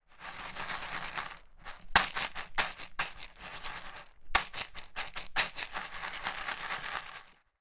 popcorn kernels in plastic dust pan shake movement